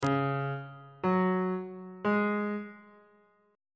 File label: c sharp suspended 4th triad chord intervals on bass clef